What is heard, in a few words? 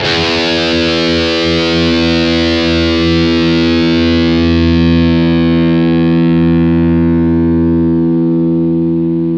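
Distorted Guitar E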